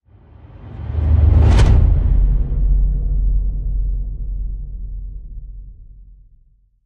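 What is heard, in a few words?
Whoosh; Sleeper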